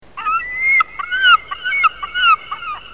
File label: Larus glaucoides